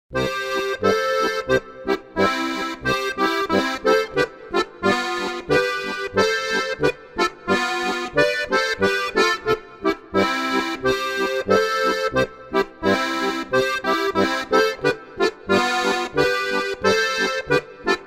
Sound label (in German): Polka